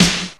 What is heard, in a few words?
ROCK PERC.